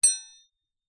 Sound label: impact metal thin stick hit; zapsplat